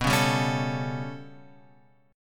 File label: B 7th Suspended 2nd Sharp 5th, chord; B7sus2#5 Chord {7 4 5 6 X 5} strummed